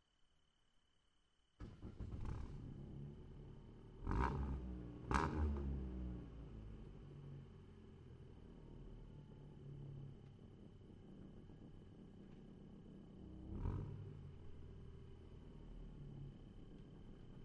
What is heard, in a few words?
before pass side